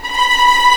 B5 TREM, VLNS TREMOLO, String; East West; The Ultimate String Collection

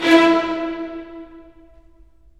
STR, STR Full Short; Roland - LA Composer vol. 4

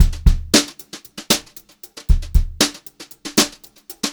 HOTROD BEAT, Brushed Drum Loops; USB Soundscan vol.39; AKAI

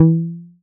perc mod tab

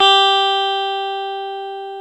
GTR, Dual Guitar; Club-50 - Foundations Roland

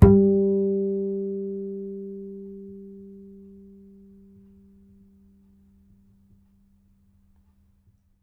Har, Upright Acoustic Bass; 1ST POSITION; Larry Seyer

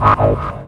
Warned Hit; M8

